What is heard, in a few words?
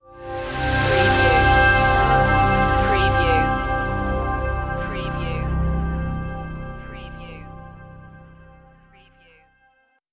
web ident